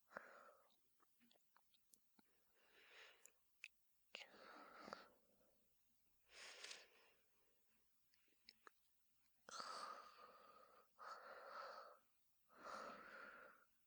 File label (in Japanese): 寝息 ループ